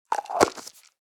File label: Plastic pill bottle twist cap closing